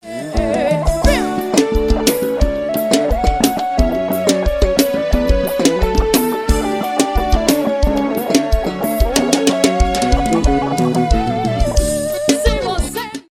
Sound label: guitar solo